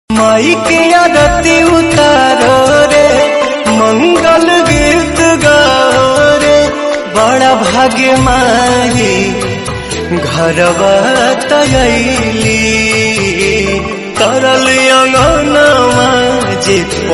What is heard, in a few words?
Aarti